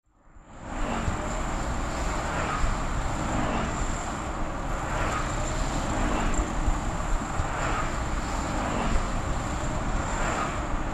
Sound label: dove